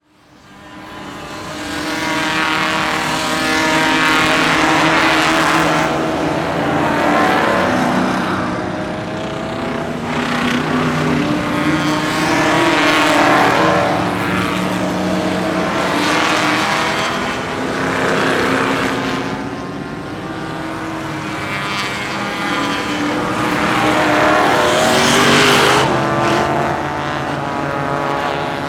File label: Motor Race Track; Blastwave FX